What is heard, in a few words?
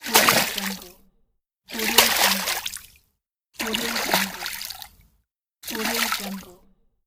the water splash